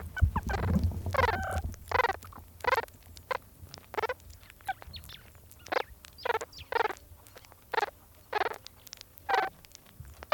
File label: meerkat pups chatting as they eat a scorpion; wilderness songs